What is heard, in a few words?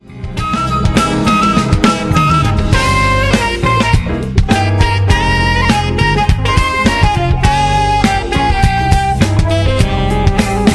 funk